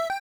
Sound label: turn on; jira credits